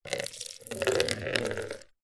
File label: foley liquid water pour into empty plastic container short